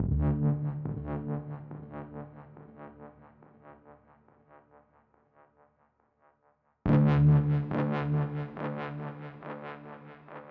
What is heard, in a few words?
GuitarSynth WET 140BPM, Dminor; Unlooped; Vengeance Samples; Beast Kit